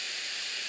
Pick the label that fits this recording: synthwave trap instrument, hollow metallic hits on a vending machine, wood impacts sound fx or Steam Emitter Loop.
Steam Emitter Loop